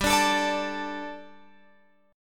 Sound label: G Suspended 2nd G, down, Guitar, Open F; Gsus2; strummed